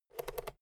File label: fader slide click quick